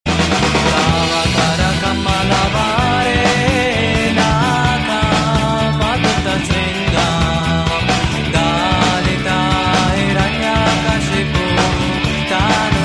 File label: piano half; classical